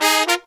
Horns, 110BPM

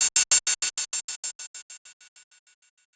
Gated Cymbal; musicradar

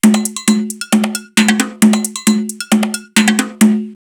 727 Peyote 134, drumloops, DrumMachines; X-Static Goldmine

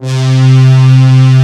D70 BIG HORN; East West; Global Sounds

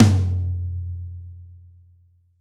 Rock Tom 47, Roland BK-5 Toms

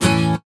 guitar stab

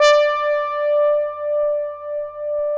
OBERHEIMS

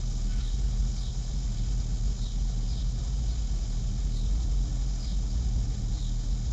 Ambience Loop, outdoor

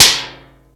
PLATE, 12-Bit Drums; E-MU Formula 4000 Series Vol. 1; Hip Hop Nation

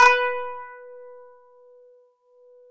KANUN B4; SoundBytes; Early Patches; KANUN SMAL

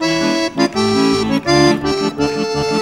ACC LINE, MELODIC; Best Service; World Colours

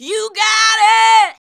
VOX VOX; Sound & Vision - Gigapack II CD 2

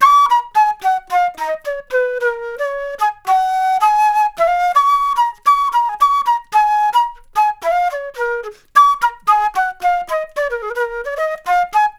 Flute Solo, Jazz; Sonic Foundry (Sony Creative Software)

